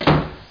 DOOR SLAM; Image Bonanza; Expert Software; househld

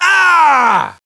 death; SINDEMO

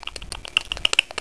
Ant Approach; Curse of Dragor

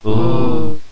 oooo; Omnia Junior - Base Terra

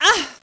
gasp; lands

